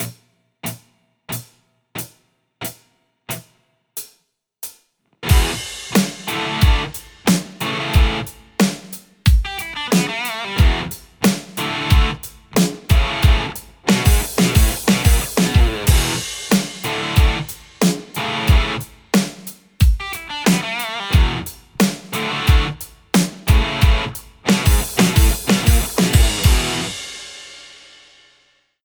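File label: Telecaster; rcvprod amplistortion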